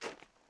pas; El Rey Arturo

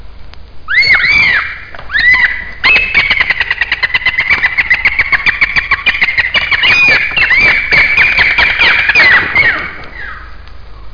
martinet alpin; creatures

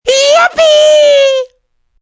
succes; Mobiclic51

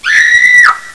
ouah; MOBICLIC 13

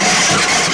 frotte; megarace

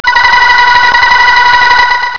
Sonnerie tél.; Interactive Cartoon Strip!

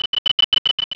bip bip; Reperages 94; tortue

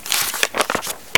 Parchemin; Semitour Perigord; Lascaux II

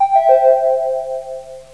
Ouverture; Learn and Play

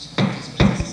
MARTEAU

